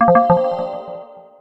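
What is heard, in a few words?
conversion failed, notifications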